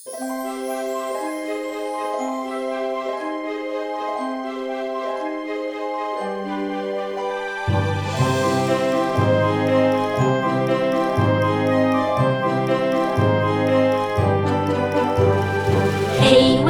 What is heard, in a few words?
theme song vocal mix